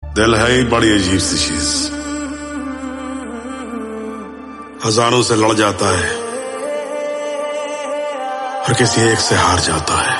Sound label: hindi song; tadap